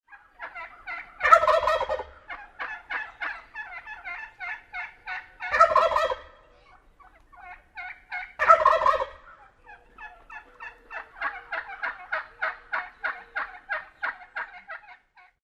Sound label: calls gobbles at roost